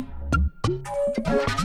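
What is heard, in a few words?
Music Dpad Base 145; from dust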